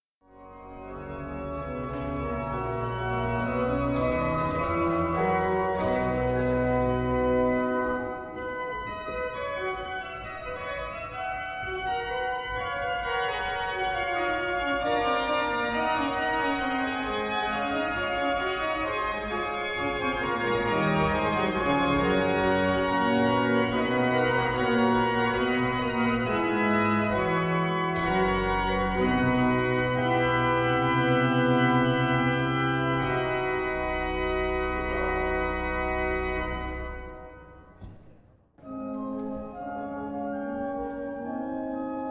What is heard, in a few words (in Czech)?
varhany, nástroj z roku 1763, dva manuály, 12 rejstříků; Má dva manuály, 12 rejstříků a zajímavostí je náznak korunního stroje; Johanna Ignáce Schmidta; Peruc